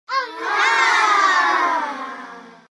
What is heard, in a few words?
children saying ahh